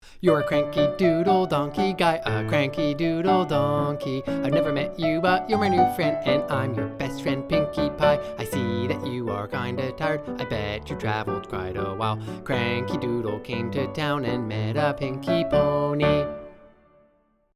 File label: Piano; My Little Pony Friendship is Magic; Season 2